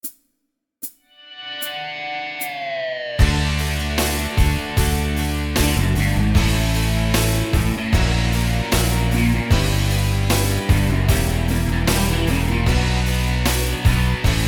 SINGBACK, BULGARIAN

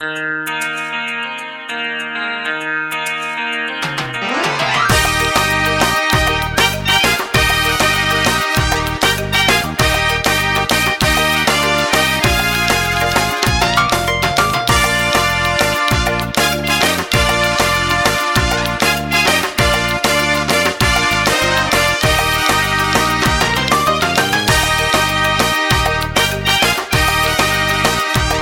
RUMBA; BULGARIAN

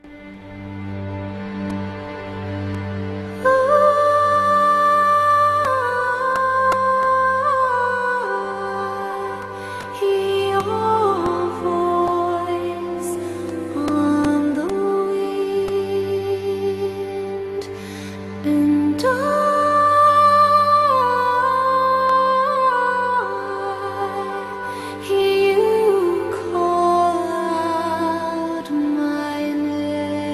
celtic Version; ENGLISH

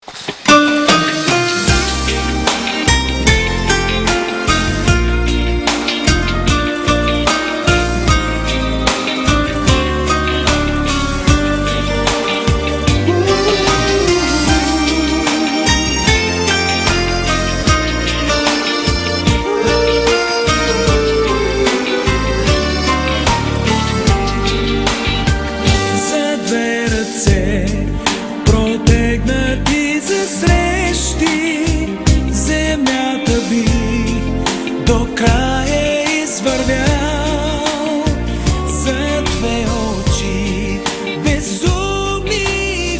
DUET; BULGARIAN

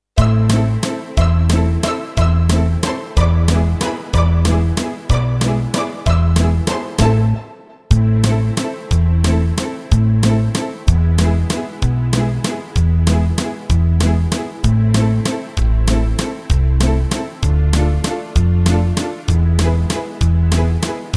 BALALAIKA, SINGBACK, BULGARIAN

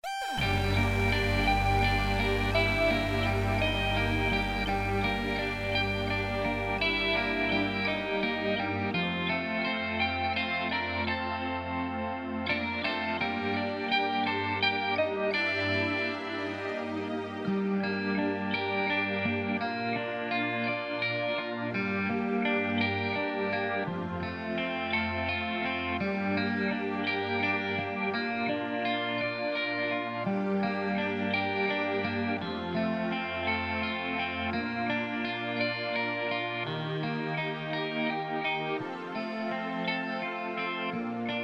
SINGBACK, BULGARIAN

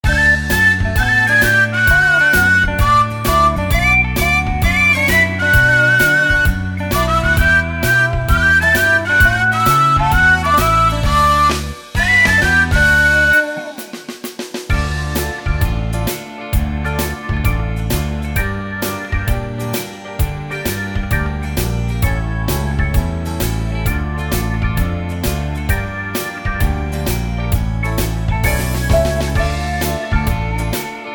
SINGBACK, BULGARIAN

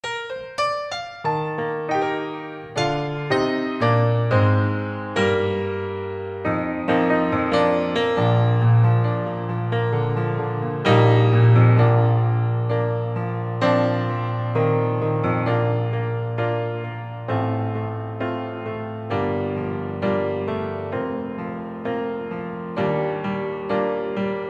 Acoustic, SINGBACK, BULGARIAN

